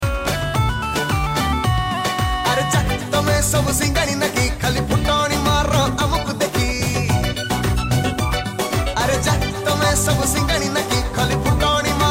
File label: Odia Song